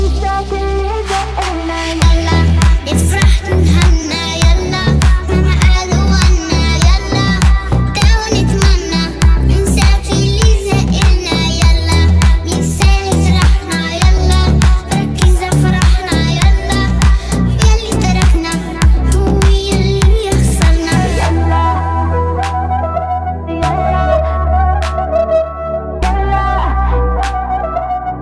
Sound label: Remix Arabic Music Ringtone